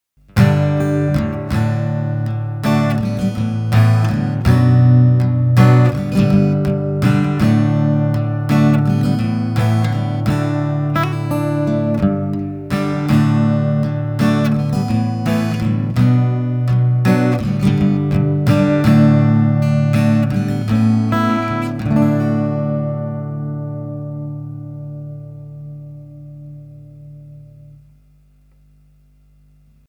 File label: ZOOM AC-3 output Chorus; Humming Bird